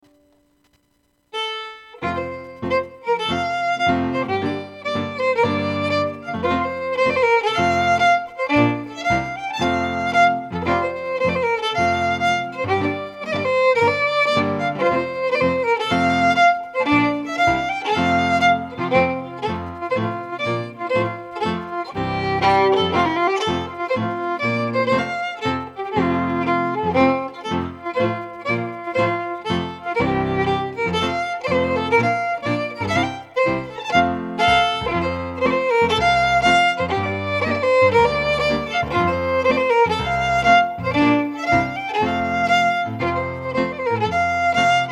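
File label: Strathspey, Scottish Fiddling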